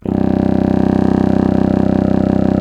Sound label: C. Bassoon, solo instruments